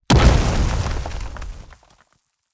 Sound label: Short Explosion Impact Sound